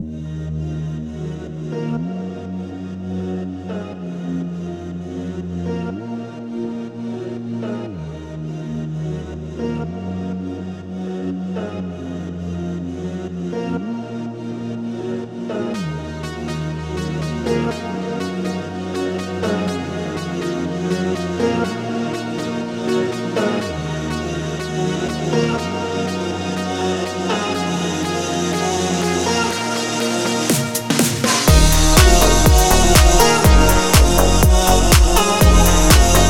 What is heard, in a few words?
Ambient